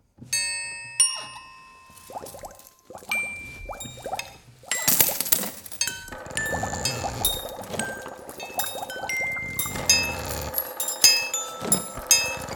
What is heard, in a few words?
Alchemist Laboratory Arrangement